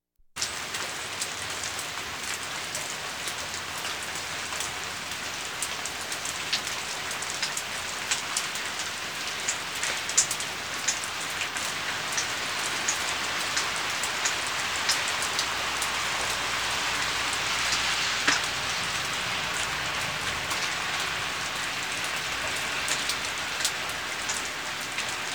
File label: Household Phones